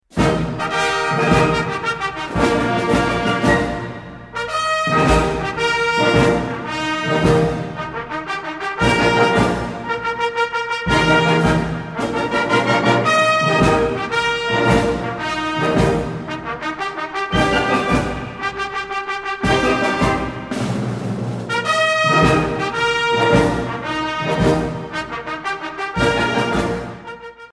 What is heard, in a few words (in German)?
marsch Fanfare